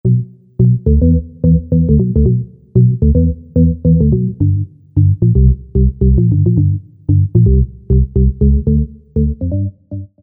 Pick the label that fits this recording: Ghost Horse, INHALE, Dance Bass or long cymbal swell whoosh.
Dance Bass